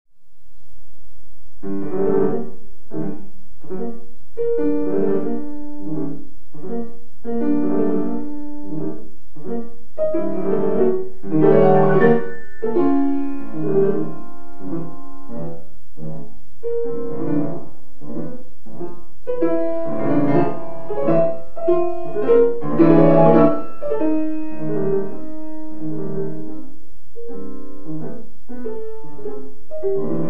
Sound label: bagatelle 2